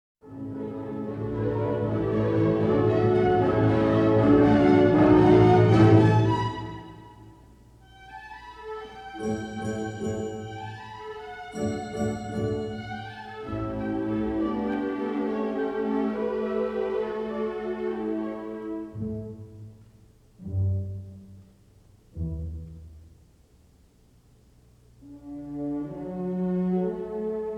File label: Waltz, Orchestra; Blue Danube Waltz